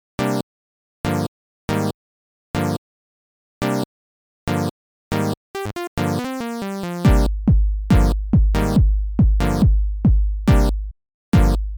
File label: untitled techno; weeklybeats-2020